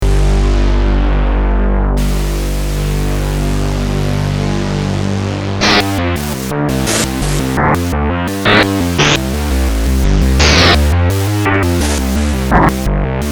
Wave Squeeze, Prophet '08

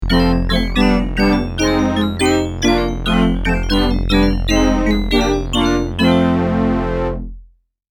Chime n Horn, Prophet '08

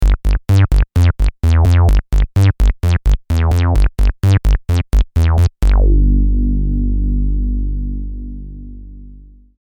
Lower Mondo Bass, Prophet '08

